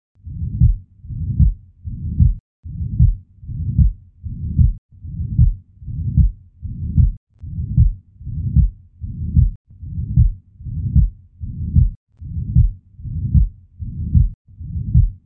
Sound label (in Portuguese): Defeito septal ventricular